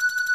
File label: big win count up; netent